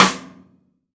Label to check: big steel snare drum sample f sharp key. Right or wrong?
Right